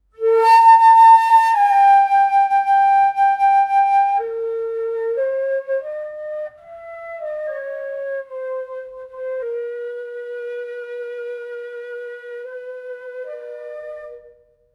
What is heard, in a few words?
khmer flute sad